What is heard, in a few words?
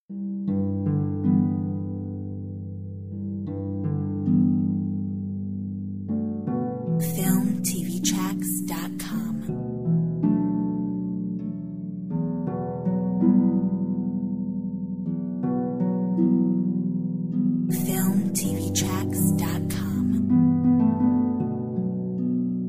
sharing dreams Harp